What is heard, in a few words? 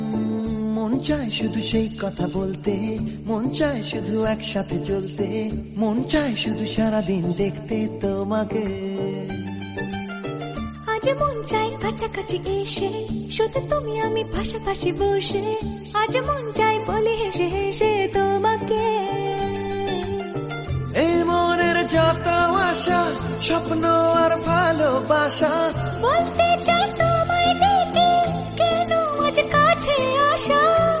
BENGALI MOVIE RINGTONE